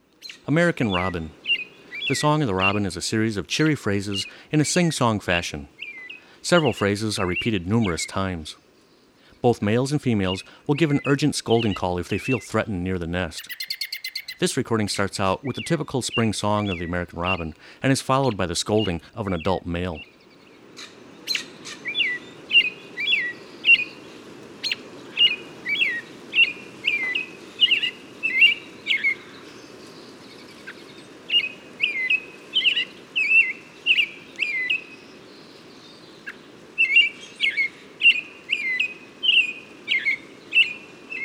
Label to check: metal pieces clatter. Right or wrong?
Wrong